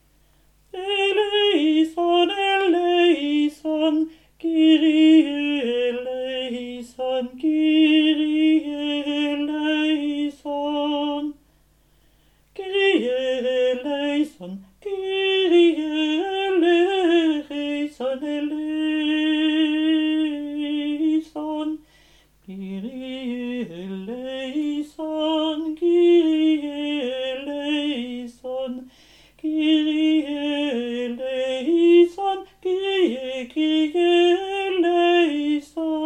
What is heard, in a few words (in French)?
kyrie alti; charpentier